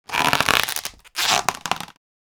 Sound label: Hard plastic crunch; gfxsounds